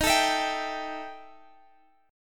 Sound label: E Minor Major 7th Double Flat 5th D sharp, guitar